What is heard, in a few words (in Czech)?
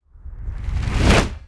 noviny; Mafie 1